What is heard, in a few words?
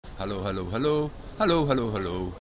loop